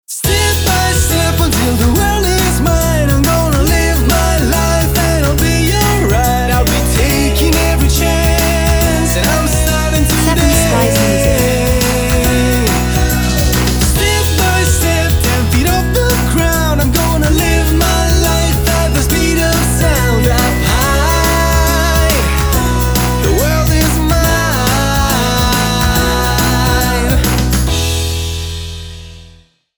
Vocals

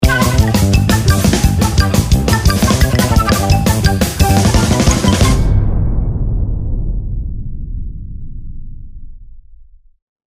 Cartoon Speed

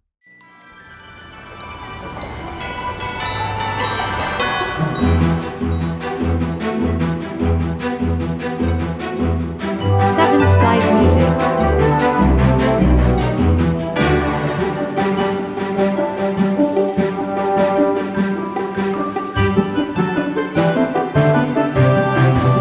Christmas commercial

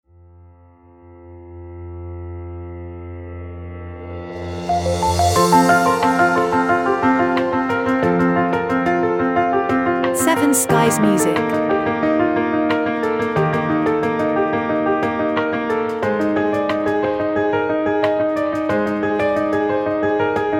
Romantic Inspirational

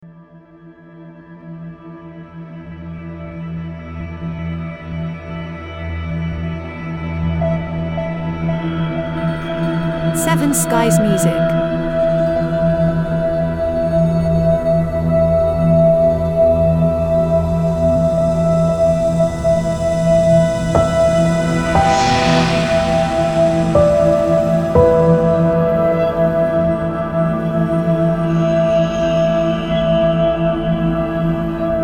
Ambient Reflextive

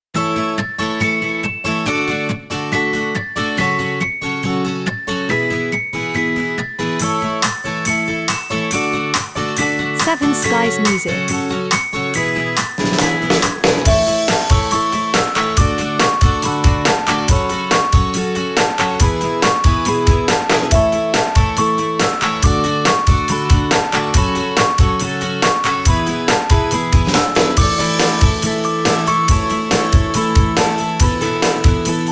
Cheerful Promo

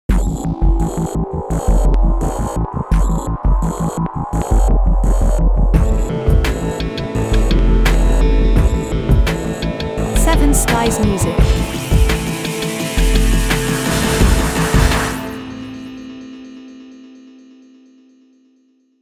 Crescendo Stingout

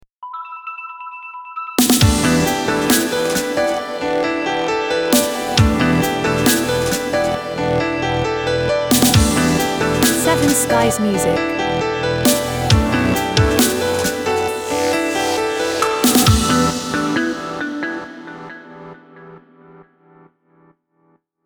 Chillstep Horizon JINGLE

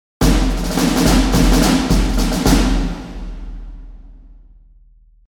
Big Cinematic snare drum

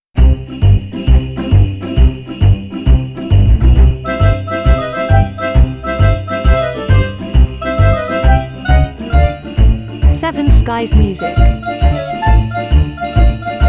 Italian Christmas

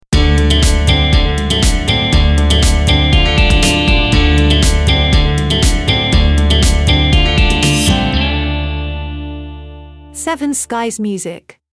Wild West Stinger

